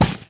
Padded Pole hit; XBOX; Amped 2

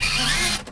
droid servo

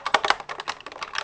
pick loop, lockpick; Soldier Of Fortune II Double Helix; XBOX

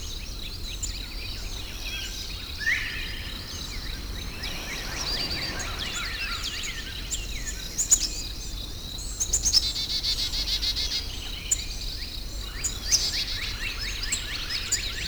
Loop Bird; ambience; Project Gotham Racing 2

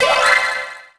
Ring Bon; Finding Nemo; XBOX

